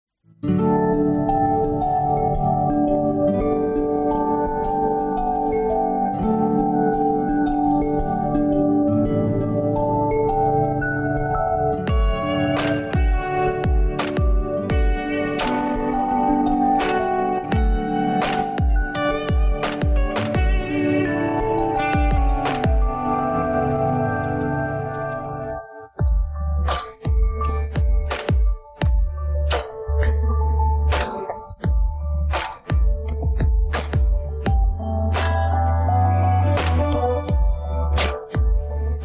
Vocal Rem

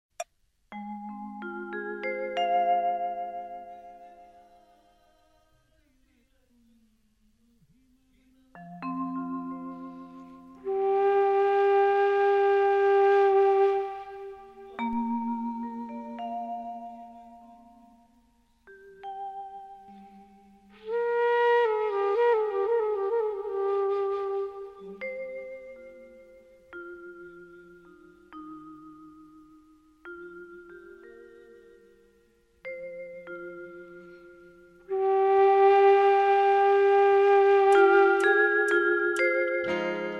Hindu Devotional Karaoke

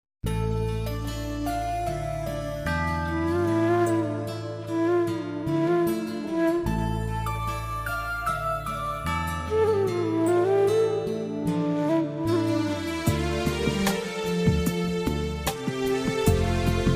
X'an Devotional Karaoke

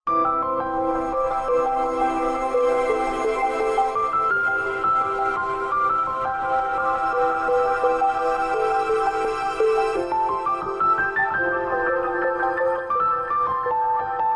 Malayalam Vocal Removed Karaoke; Malayalam Original Project; Roofy Rhythms

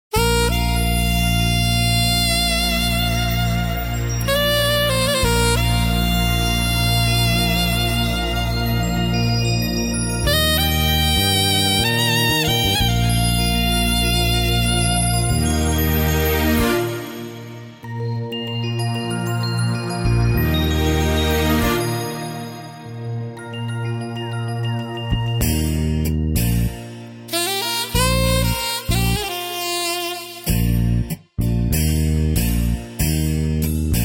X'an Devotional Karaoke

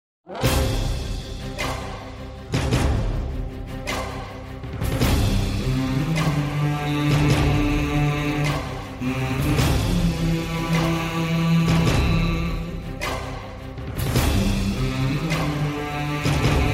Karaoke

